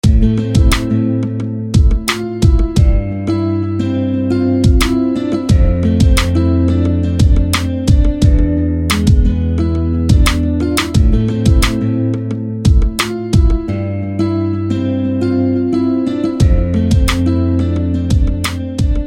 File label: Sweet Melody G; SFB FX